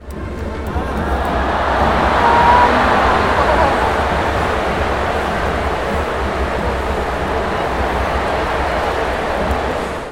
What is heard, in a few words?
baseball cheer